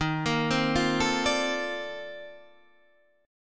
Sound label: C Minor 13th Eb